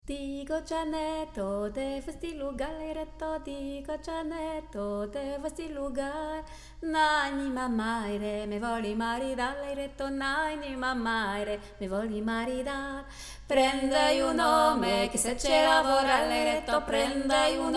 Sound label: tutti, chant; DIGO JANETO/SCALERICA DE ORO; Orfées Productions